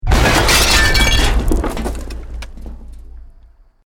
EXPLOSION MEDIUM BRICK WOOD GLASS NO FIRE; Created explosion effects, house, building or vehicle effects; Bomb

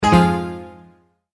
multimedia tone error

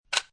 Load, Gun Handling; VRSonic; Guns

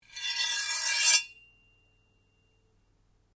Blade Scrape Slow Ring, Sword; Foley sounds for melee weapons; Swords (Sound Effects:Combat)

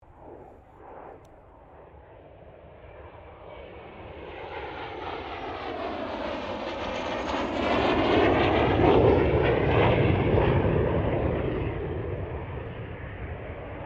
AIRCRAFT CA-27 SABRE PASS STEREO; F-86 Sabre; Airplanes; Vehicles

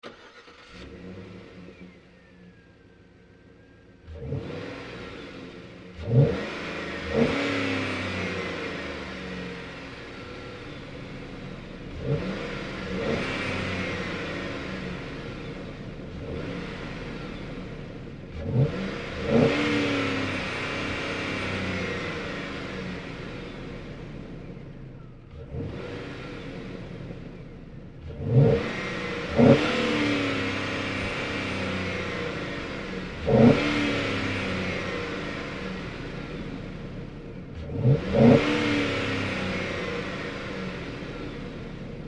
Truck GMC Savana Onboard Start Revs Off Hard

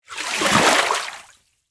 Water Foot Splash, Pool; Water Sound Effects; Water (Sound Effects:Nature); BlastWave

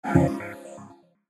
DIGITAL SWEEPER; Digital, Filter, Vocal, Distorted, Production Element, Imaging Element, Accent, Transition; Multimedia; Blastwave

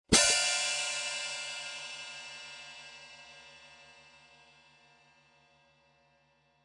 Cartoon Drum High Hat Close And Open; Comedy/Cartoon - Drum, High Hat Close and Open 02: Ringing small cymbal; Funny Sound Sound Effects

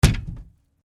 Cardboard box hit

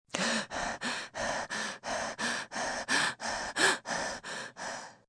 Breathing Pant, Breathing, female, panting, fast; human voice

